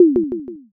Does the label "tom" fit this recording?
Yes